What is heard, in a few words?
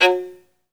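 solo violin, strings; ILIO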